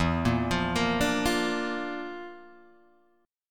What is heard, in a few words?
Bb Major E; Bb/E arpeggiated; JGuitar